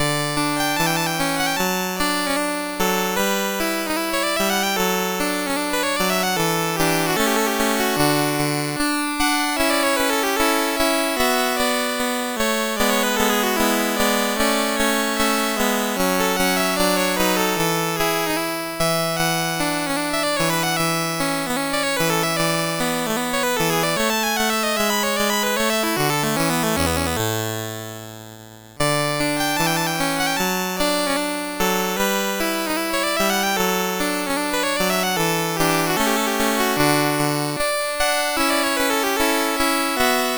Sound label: Adagio from Sonata in D Major, MOS6581R2